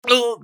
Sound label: usec wound; eft; scales